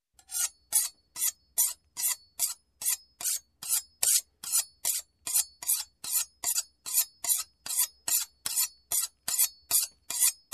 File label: Knife Sharpening